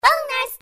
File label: bonus